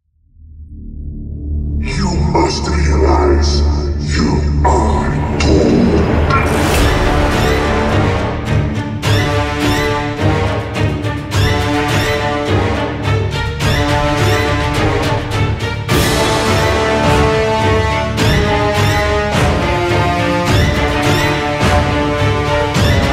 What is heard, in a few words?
apocalypse, ambience